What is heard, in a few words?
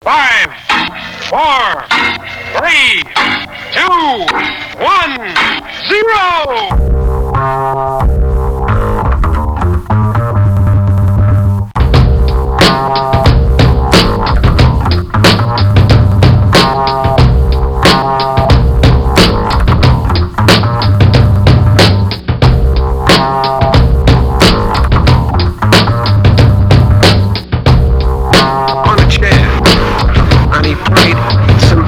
Jazz Bass